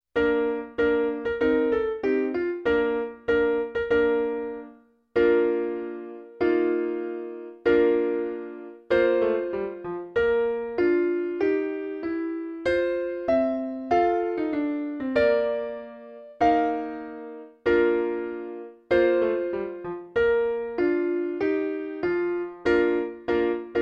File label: March, Horn